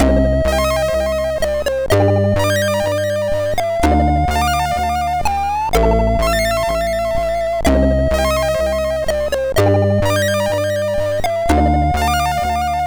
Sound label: Fast Atmosphere, MOS6581R4